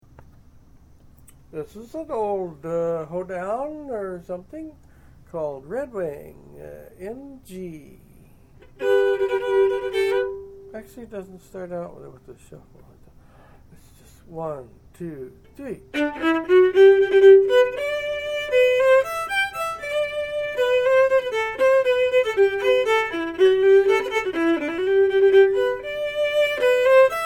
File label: Redwing, Fiddle chords Reels